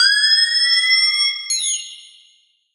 Sonar Decoy

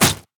Limb Slash

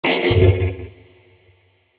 CARRIER damage

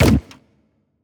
CARRIER spike Launch

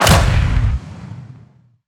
harpoon Coil Rifle Shot; WEAPON

